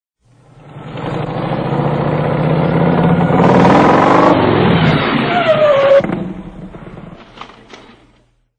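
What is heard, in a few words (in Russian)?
подъезд, тормоза мотоцикла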